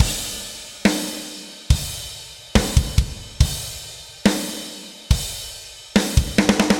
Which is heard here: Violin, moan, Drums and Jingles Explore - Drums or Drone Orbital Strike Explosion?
Drums and Jingles Explore - Drums